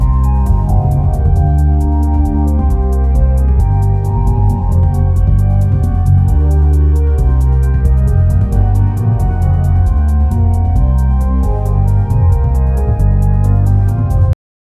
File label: main menu ruglike game, loop